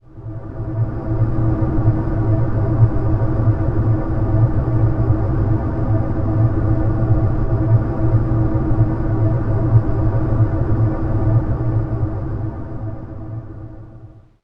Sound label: Tomb Drone A; Zero-G Seismic Frequencies; Ancient Drones; 90 s Sample CDs